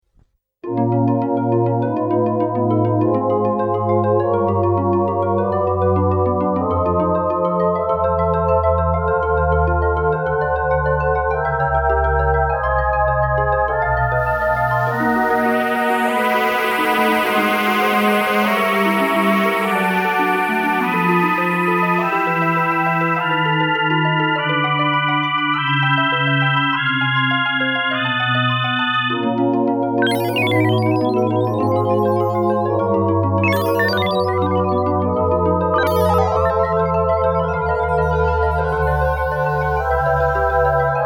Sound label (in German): zwischenmusik